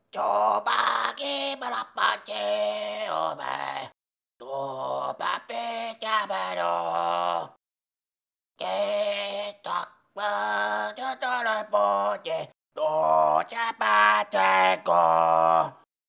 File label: sing; Grindle; Hollow Knight: Silksong